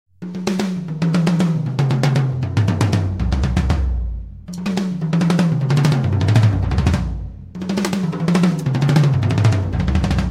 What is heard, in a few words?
Toms